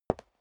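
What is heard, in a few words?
step high heels concrete single right, footsteps; schritte; menschen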